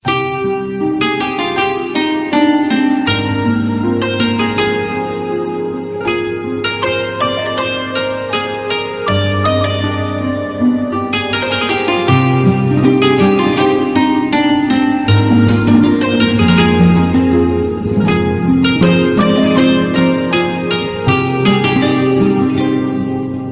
amazing ringtone for iphone

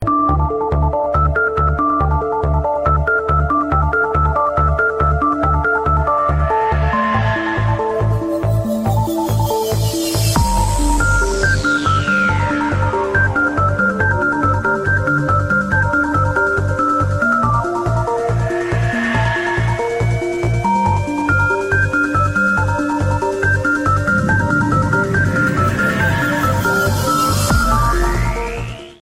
iphone modified ringtone

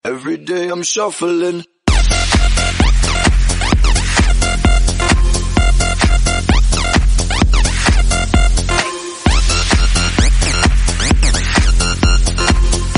shuffle party ringtone

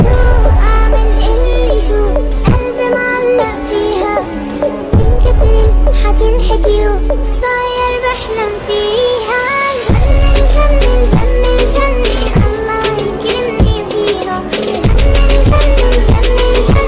arabic music ringtone

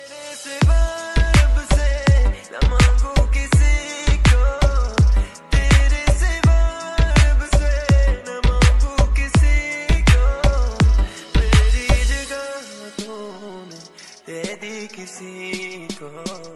ringtone, bollywood ringtones

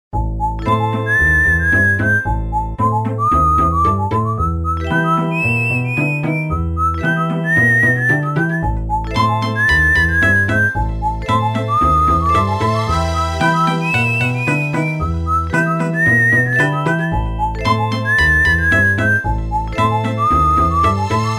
nokia espionage ringtone

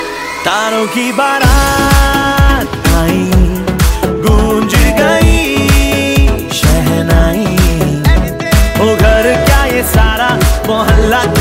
ringtone bollywood ringtones

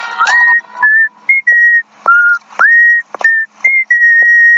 whistle ringtone; sms ringtones

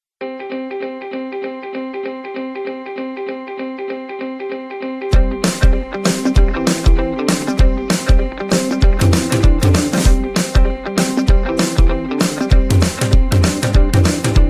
nice ringtone

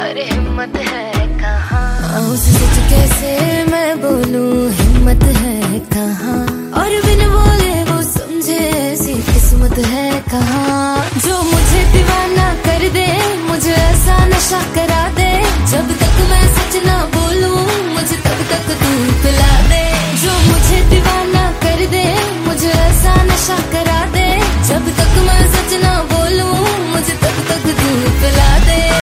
hindi ringtone

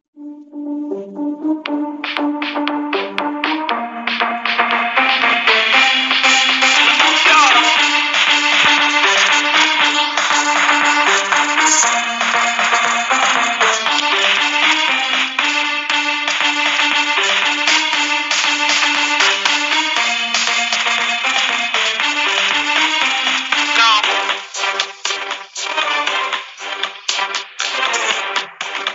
firey music ringtone

